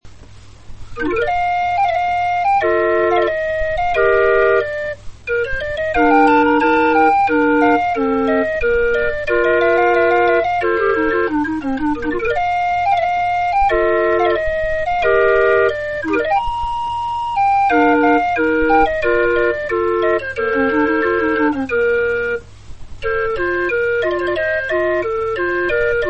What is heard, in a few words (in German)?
floetenuhr